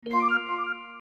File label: slyder on; samsung d900i; Resman55Pro patcher